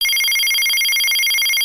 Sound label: Nextel Ringer